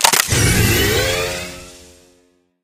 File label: powerup pickup haste; Source Engine; tf